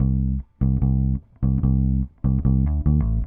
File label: Bass, Indie Rock